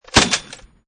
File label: Mk19 Fire, Machine Gun; Machine Guns; VRSonic